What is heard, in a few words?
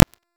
Fist Claw Spike Despawn; Warframe; Tenno; Incarnon